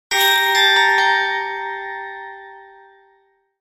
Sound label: message alert tone for android